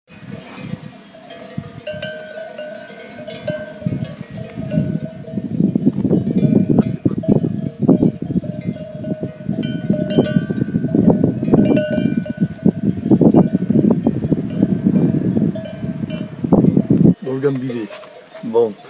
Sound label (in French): Moutons Béarnais